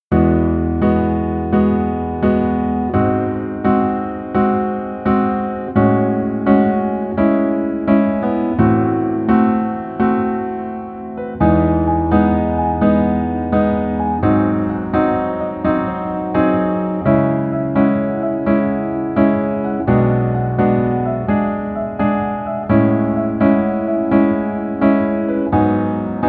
Piano ver 멜로디 MR -1 키

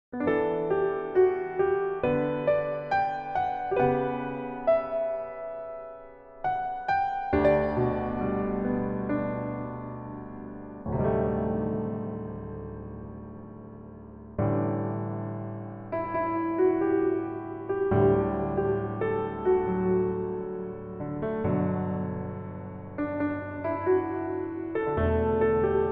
Piano ver 멜로디MR 남자키

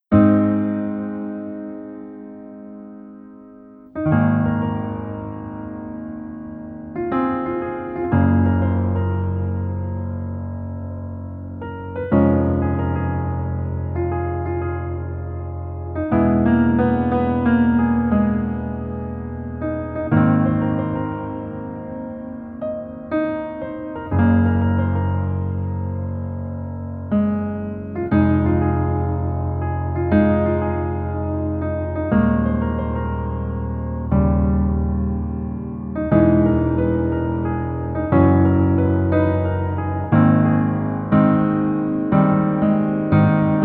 Piano ver, 멜로디MR

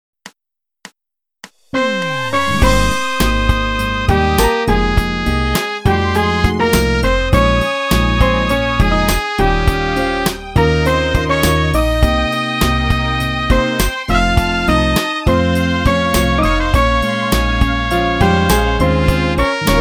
멜로디MR -1키

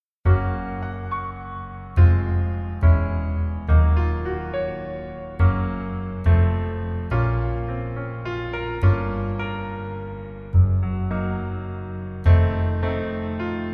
Piano ver -1 키

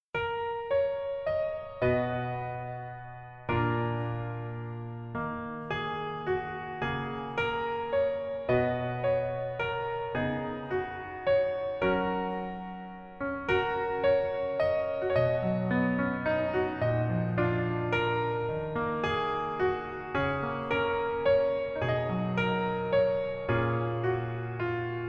Piano ver -1 키

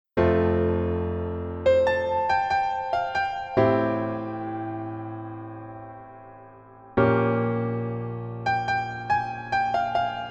PIano ver 멜로디MR 여자키

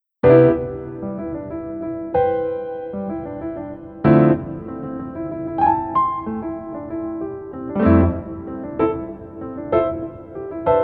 Piano ver, 멜로디MR

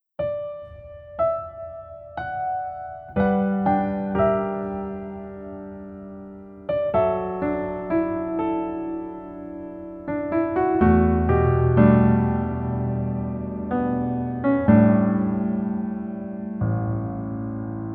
Piano ver 남자키

